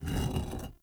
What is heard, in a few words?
Shrine Puzzle Stag Turn; Doors